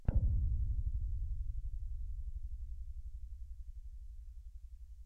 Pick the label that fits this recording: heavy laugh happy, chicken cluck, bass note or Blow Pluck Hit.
bass note